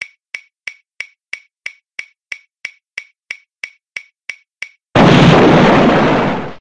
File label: time bomb